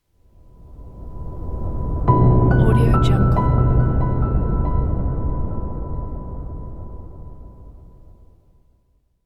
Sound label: elegant cinematic logo